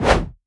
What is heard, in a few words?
Whoosh Quick Tennis Smash